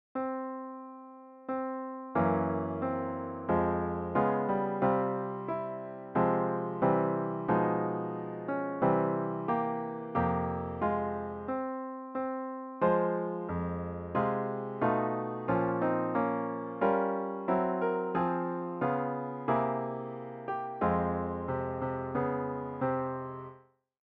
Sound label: augmenteded sixths; birthday